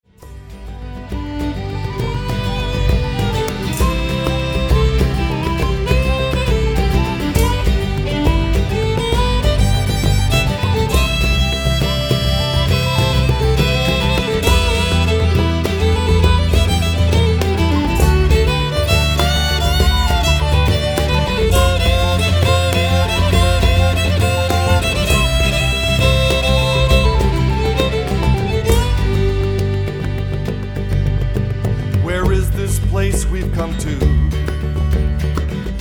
Irish Band

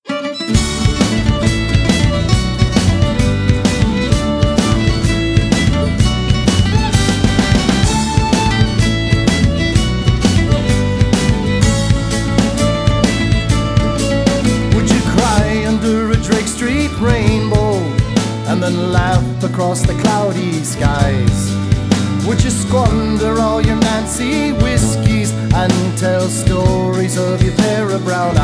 Irish Band; Chicago

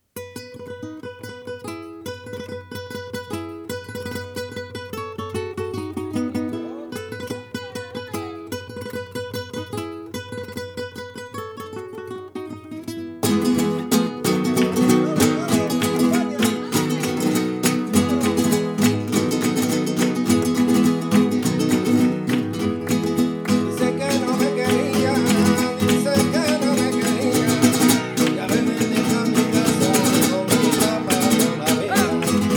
Flamenco Group, Garrotin; Montreal

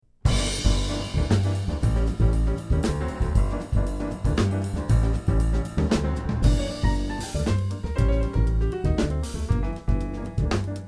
Jazz Band; San Diego Jazz Pianist 1; Origin Records

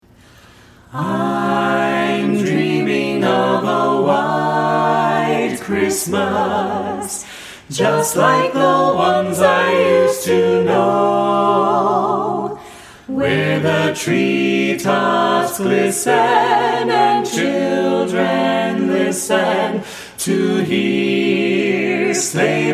Carolers

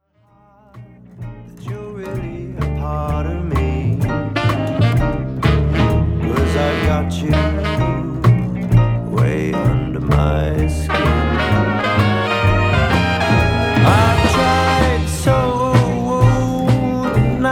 Wedding Band; cover band

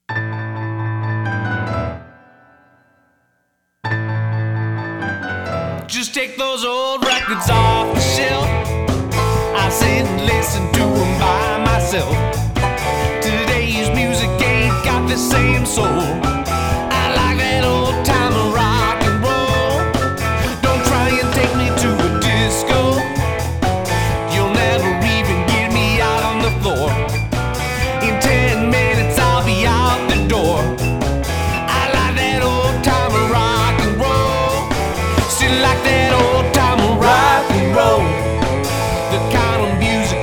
Variety Band; Chicago; This Chicago Variety Band is a real band, performing authentic music. They are based out of Chicago, Illinois

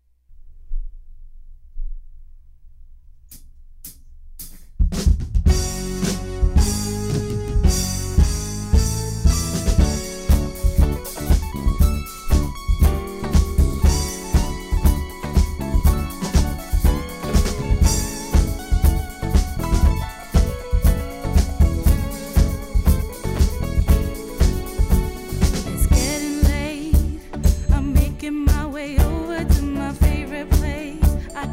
Party Band

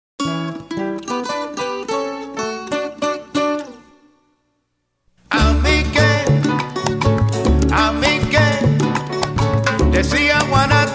Latin Band

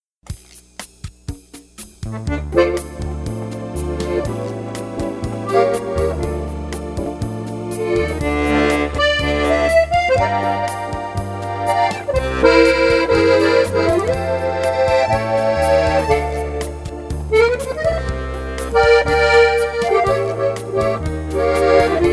French Band; Denver

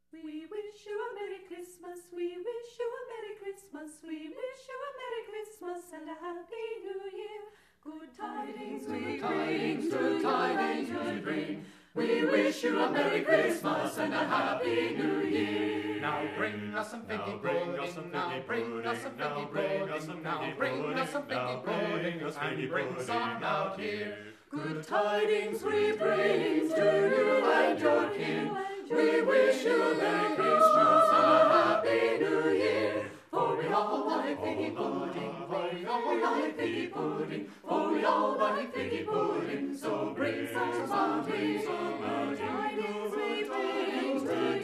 Carolers

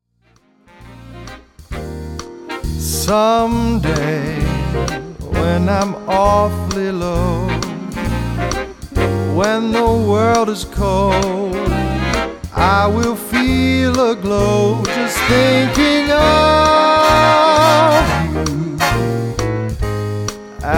Big Band

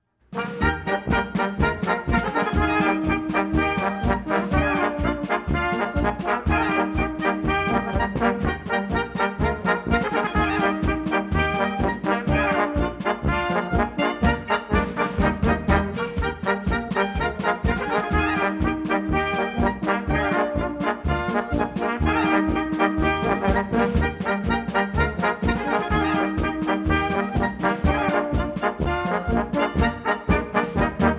Oompah Band, Polka; Seattle

